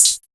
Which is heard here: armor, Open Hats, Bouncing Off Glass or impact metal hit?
Open Hats